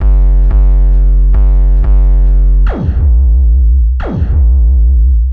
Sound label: Victory Bass, Drum & Bass; Fluid Dynamics